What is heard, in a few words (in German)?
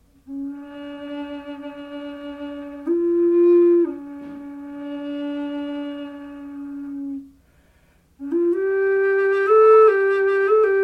Bassflöte D